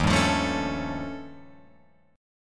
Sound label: D sharp 9th Flat 5th D sharp, Guitar Open F; D#9b5 strummed; JGuitar